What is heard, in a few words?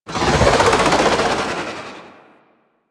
Baby Dragon Vocal Idle; Enemies; Warframe; Duviri; Void Dragon